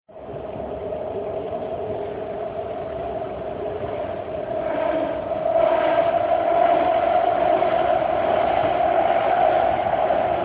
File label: bart train sounds